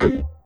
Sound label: perc, 100bpm